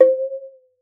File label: WATER; Xsample VOL-9